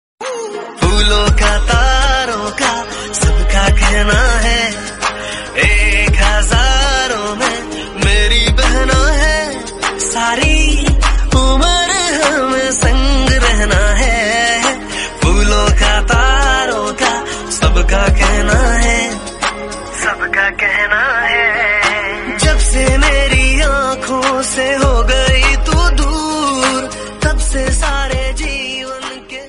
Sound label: instrumental bgm, hindi; raksha bandhan